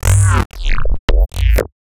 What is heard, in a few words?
Glitch